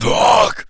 Attack, Zombies; Attacks; zps; Carrier

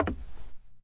woodpanel, Footsteps; zps; Foley

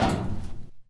metal grate, Footsteps; zps; Foley

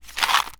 Ammo Pickup; zps

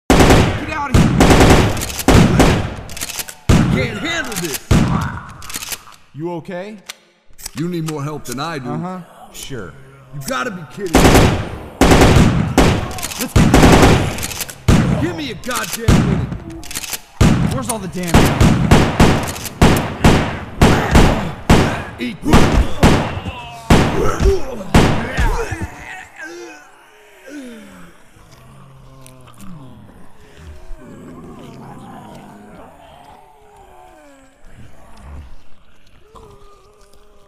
Distant Battle, Distant Gunfire; zps; Ambient

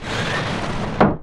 icecooler open; zps

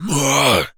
Jump, Zombies; zps; Carrier

